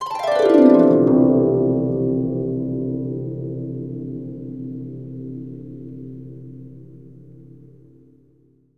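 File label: harp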